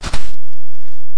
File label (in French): pas hom sable; Time Machine; Bruitages